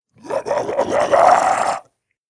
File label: Beast Monster; horror; Andrew Fleming